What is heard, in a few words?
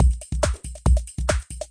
140 electro tekno, drum loops and breaks